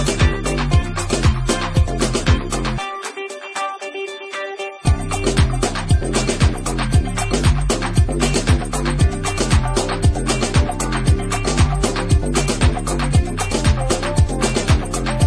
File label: electro disco